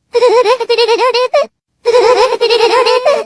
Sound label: mambo laugh; matikanetannhauser